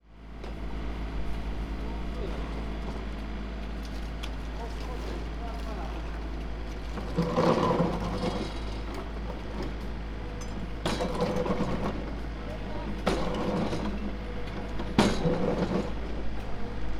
remove soil; watari

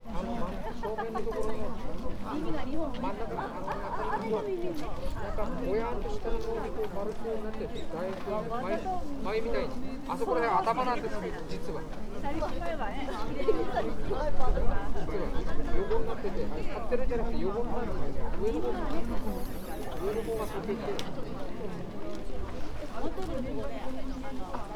hanamiyama top of top

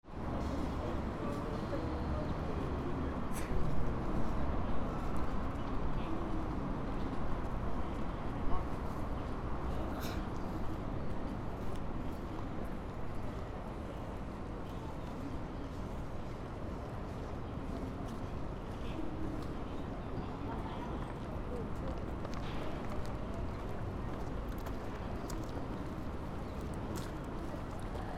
fukushima station square

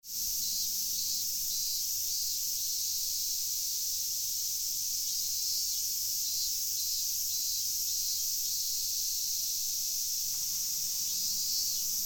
cicada at parking

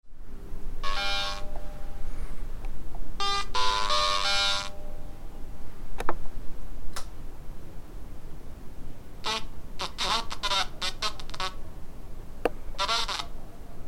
jalert drill; fukudai

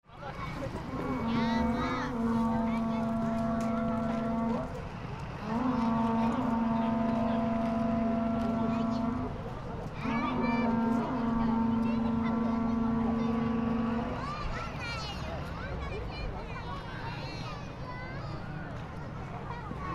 shinobu komayama parade

